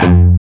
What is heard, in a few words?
CHUG F 1 R, Guitar; East West; Steve Stevens Guitar Samples Collection